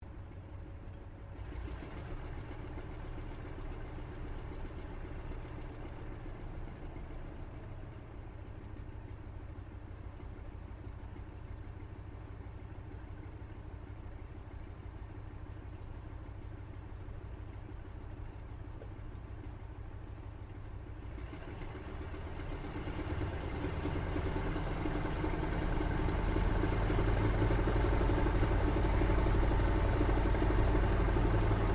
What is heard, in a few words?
AIRCRAFT CAC WIRRAWAY SHUTDOWN INTERIOR; CA-16 Wirraway; Airplanes (Sound Effects:Vehicles)